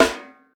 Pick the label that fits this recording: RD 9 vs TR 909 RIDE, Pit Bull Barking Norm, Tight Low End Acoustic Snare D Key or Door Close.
Tight Low End Acoustic Snare D Key